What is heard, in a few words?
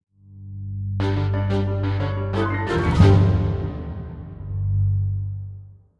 Combat Theme End; windscape switch windows xbox one gamerip 2016